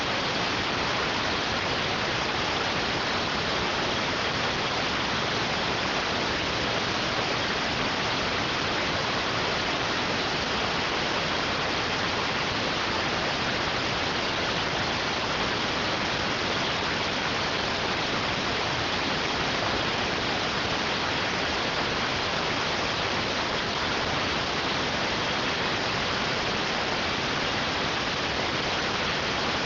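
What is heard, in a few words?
radioteletype receive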